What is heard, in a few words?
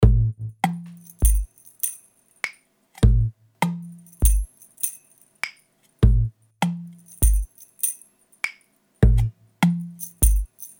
Five-Four Guitar Groove